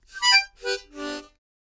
Mouth-organ, Short Final Chord, Type 1 - Descending; woodwinds harmonica